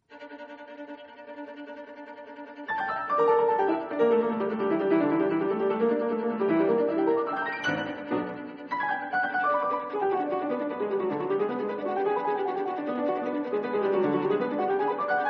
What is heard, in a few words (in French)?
Sonate violon piano, Sonate, violon et piano; 2. Véloce; Paris : Heugel, 1927